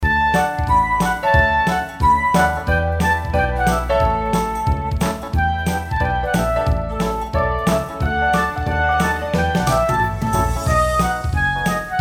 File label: music